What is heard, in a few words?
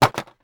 stone drop concrete